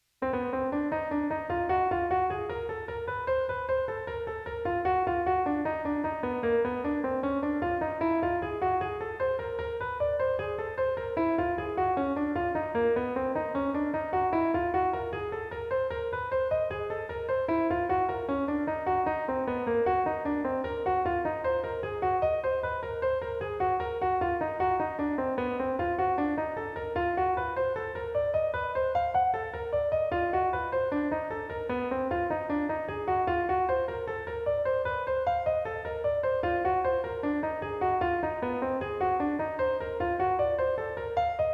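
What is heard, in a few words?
Half Diminished Chord Embellishment, jazz piano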